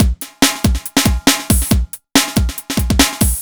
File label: Synth Loop BPM140